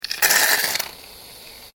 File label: household shaving foam spray; Aerosol Shaving Foam Spraying / Squirting From Can; Bathroom (Sound Effects:Household); McKinney Sound